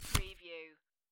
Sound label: Playing Cards Slide One Card Off Top Of Deck